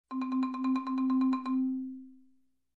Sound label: Marimba One Note Repetition